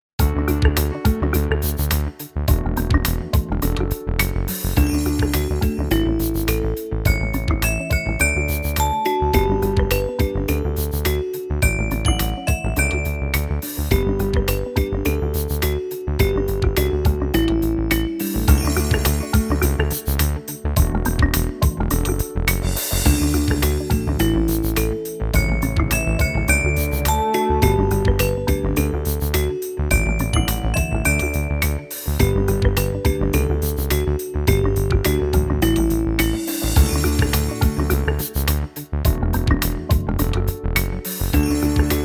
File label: karaoke; family